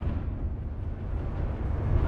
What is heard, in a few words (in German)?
Spannung Start